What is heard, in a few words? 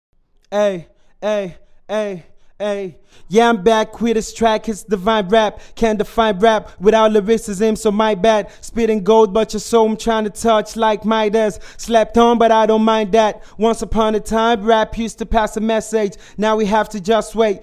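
hip hop, 85, B flat minor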